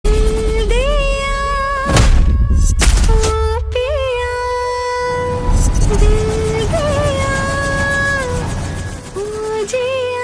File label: Female Ringtone